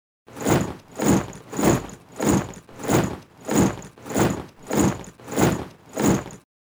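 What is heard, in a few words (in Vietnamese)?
Tiếng bước chân binh lính diễu hành trong quân đội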